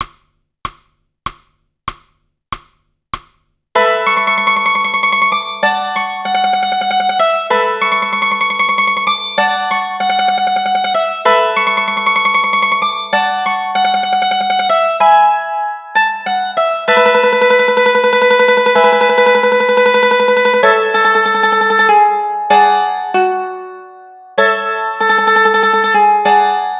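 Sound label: Mandolin Melody Chord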